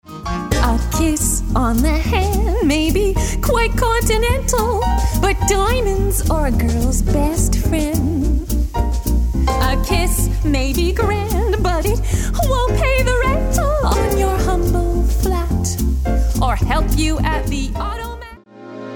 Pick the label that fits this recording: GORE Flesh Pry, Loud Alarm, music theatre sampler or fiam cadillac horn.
music theatre sampler